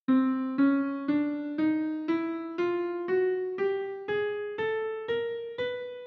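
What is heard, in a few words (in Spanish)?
escala cromática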